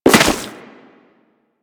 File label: Crewman Step Dirt, Footsteps; Warframe; Corpus